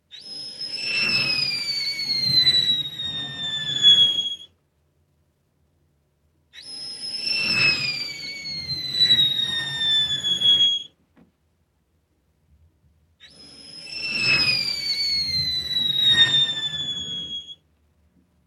Pirotecnico; olofonia

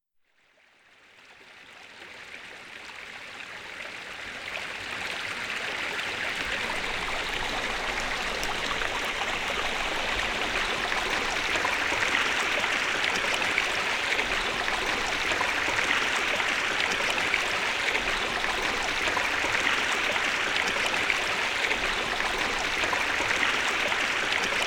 cascata, olofonic; olofonica; illusioni sonore

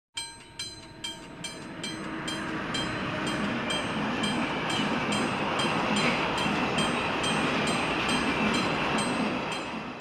Treno, Olofonia

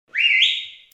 Fischio; olofonia

